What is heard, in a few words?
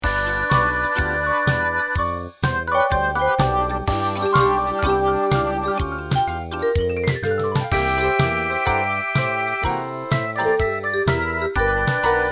blues